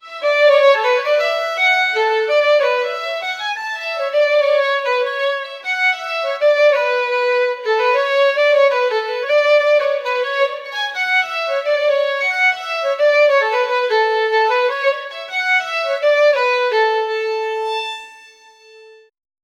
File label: a cheerful melody on the, instruments